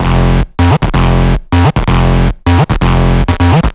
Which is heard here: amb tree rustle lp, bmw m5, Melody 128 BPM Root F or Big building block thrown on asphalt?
Melody 128 BPM Root F